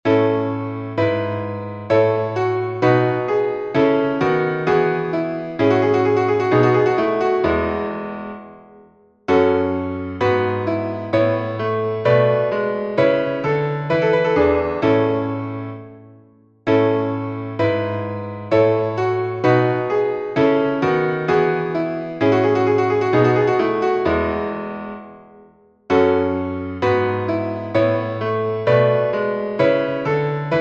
chorale for piano; bach johann sebastian; eine stimme sst sich ren

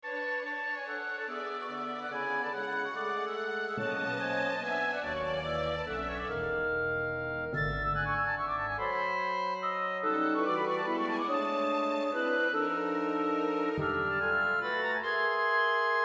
scherzo in a major; amedeo berezet; scherzo atematico

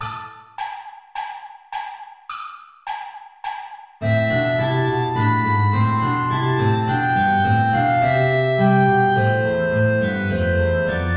play along without guitar; bach johann sebastian

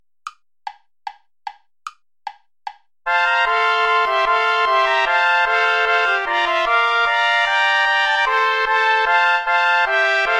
bourree play along without trumpet; praetorius michael iii

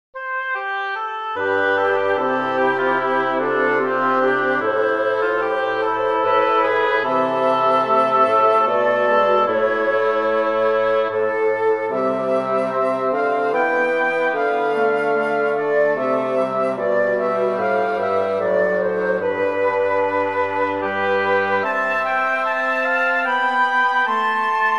for woodwind quartet

